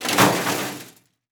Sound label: Crash Crane Drop Car Short; crashes; AdobeCC